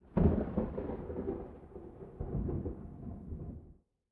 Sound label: thunder; the wolfs night